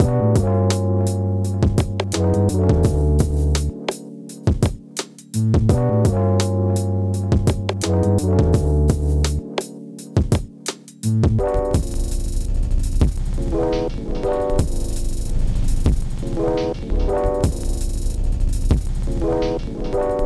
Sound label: Vintage Vibes